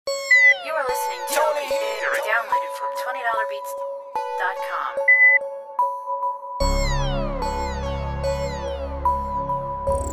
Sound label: Drip, Dirty South beats